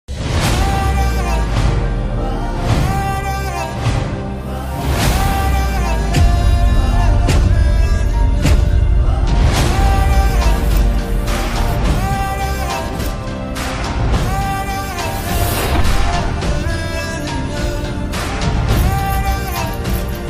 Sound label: announcement bgm; jigra; alia bhatt; vasan bala; karan johar